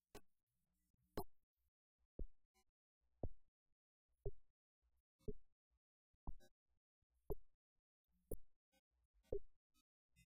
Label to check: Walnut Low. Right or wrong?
Right